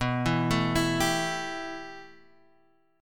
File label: B 7th Suspended 4th Sharp 5th; arpeggiated; {X 2 2 2 5 3}